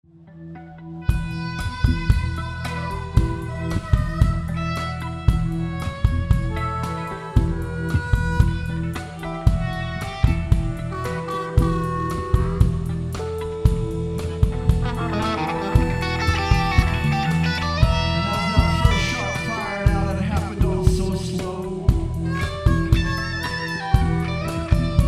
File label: Jam Live